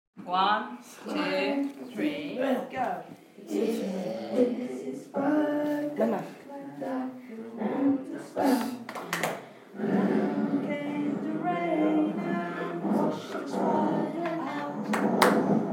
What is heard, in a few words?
class singing